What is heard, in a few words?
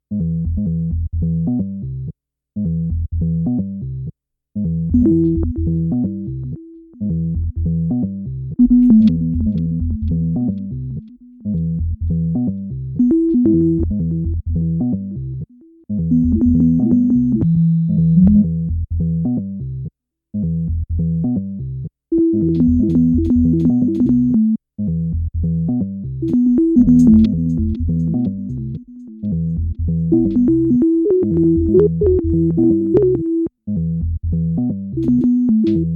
keyboard jam